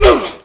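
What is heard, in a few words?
damage hit, duel; Pirates Of The Caribbean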